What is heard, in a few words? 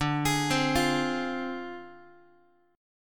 D Minor 7th Flat 5th Guitar; Dm7b5; arpeggiated